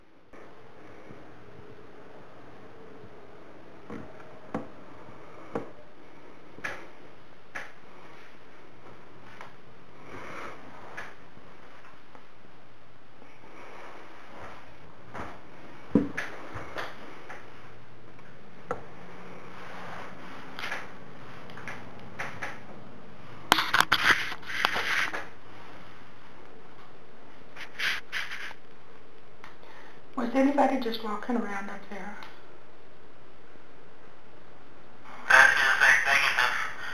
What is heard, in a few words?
footsteps overhead